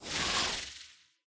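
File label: pterodactyl stun; pterodaktilj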